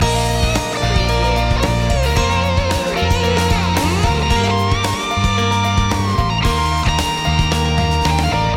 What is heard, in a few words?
loop chorus solo